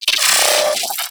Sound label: Arachnoid Micro Vocal Pain; Warframe